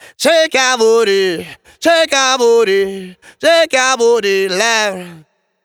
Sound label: Shake, reggae регги